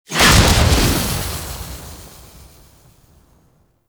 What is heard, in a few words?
Kuva Lich Death Burst; Abilities